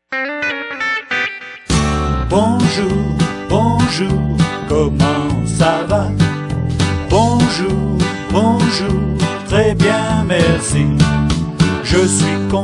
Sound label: french greetings